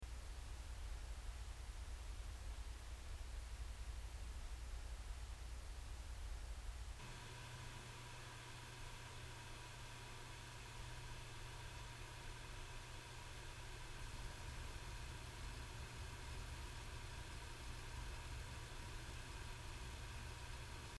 seagate sshd 2tb

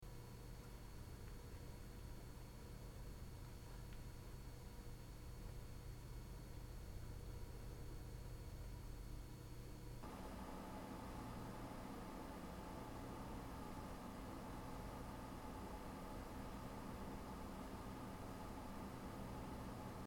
idle low fans 24 dBA; qmicra; 1m

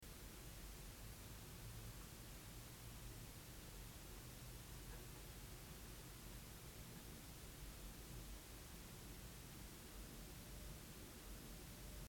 vibration reference

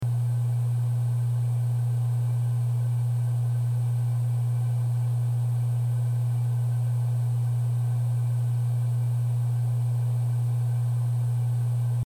vibration reference

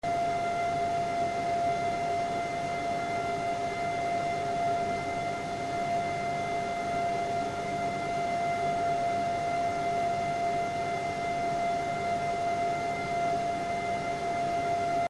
Damped Case Front

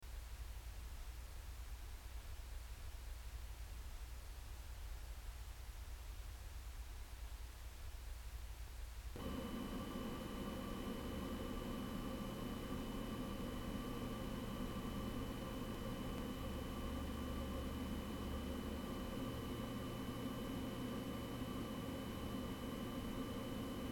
silencio 450 idle load

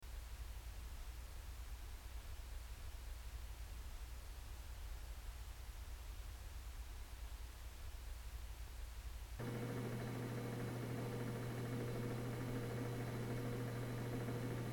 cosmos2 crossfire idle low load med

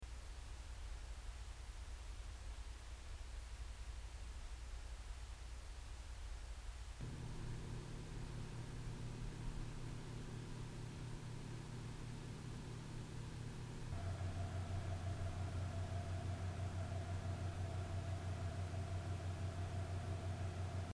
antec s10 idle min no fans 1 drive 5 drives

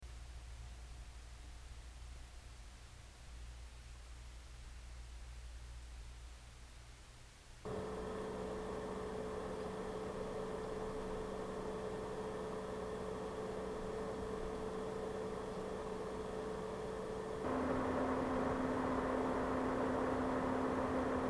lenovo m58p 0.6m idle max32dba

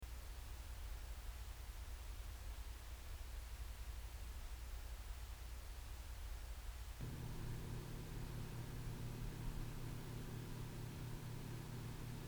antec s10 baseline hdd 600rpm 6fans

